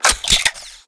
bolt breech alliance assault rifle

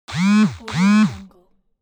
cell phone text vibrate

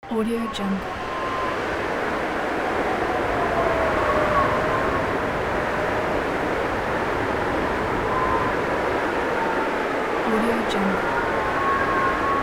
wind howling

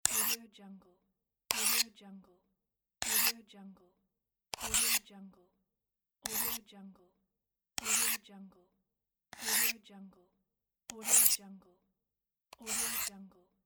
pocket knife sharpening with a diamond stone

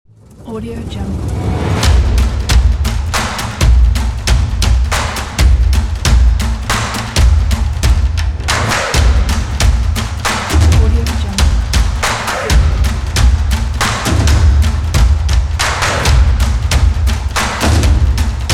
percussion action and sports

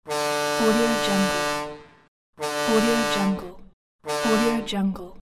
boat siren

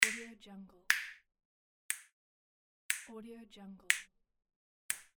wide snap pack

